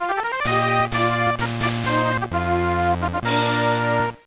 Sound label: Unknown Jingle; Tweety and the Magic Gems; GBA